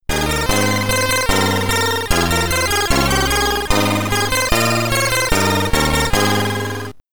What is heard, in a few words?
Waltzing Matilda (SID Stereo), Commodore 64; Kings of the Beach